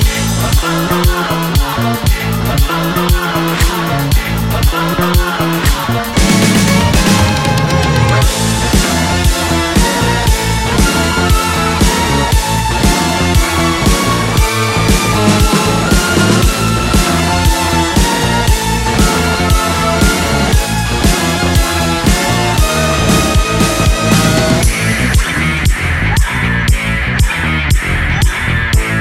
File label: orchestra